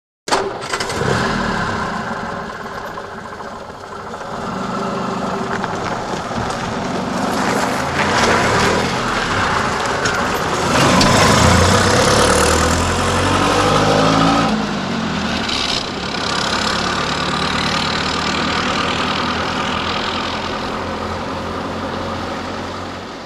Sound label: Start Away Old Citroen, Automobile; Start / Away; Old Citroen Close Start; Old Citroen Close Start Up And Away On Slightly Gritty Surface